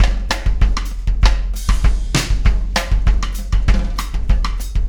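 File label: Beat, left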